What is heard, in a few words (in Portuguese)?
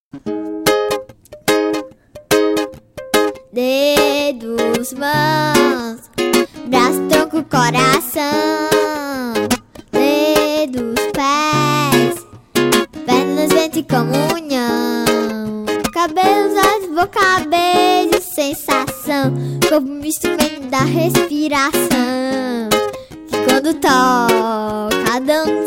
Solo Feminino Corpo Total